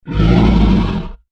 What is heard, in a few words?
Dinosaur Snarl, Creature; Animal; Animals; BlastWave